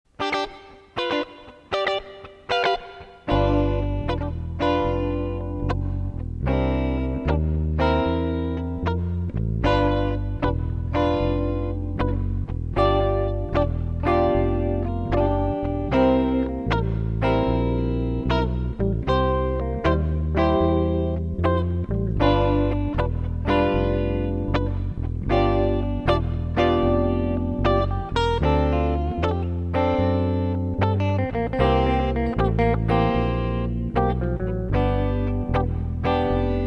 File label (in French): Blues